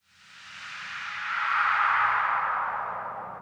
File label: SPACE FX 140; SpaceSounds